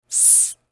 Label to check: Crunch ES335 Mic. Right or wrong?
Wrong